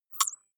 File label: click synthetic squeak, signale